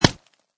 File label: Stab, Knife, dagger or bayonet stab into body; Stabs and Slices; Combat